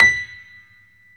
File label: Stereo Grand, Keyboards